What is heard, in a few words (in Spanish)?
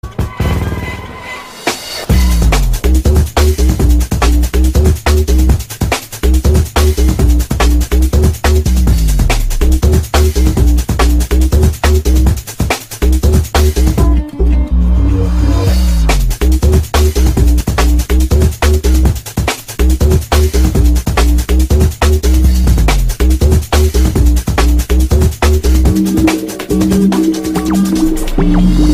guitarra